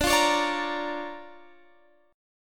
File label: D Minor Major 7th Sharp 5th, D, Guitar, Standard; x,17,15,18,15,18; DmM7#5 strummed; JGuitar